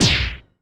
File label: Korg 01W MegaDrums; 300 Drum Machines